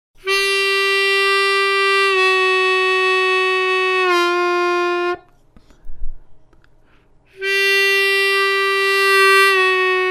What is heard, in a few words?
draw bend hole 2, armonica